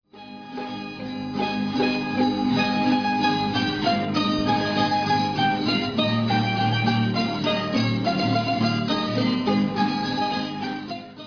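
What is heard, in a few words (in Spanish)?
bandurria; tradiberia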